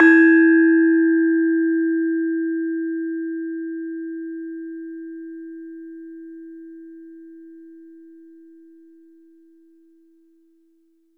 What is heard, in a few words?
LAMEL E3 -L; USB Soundscan vol.60; AKAI; LAMELOFO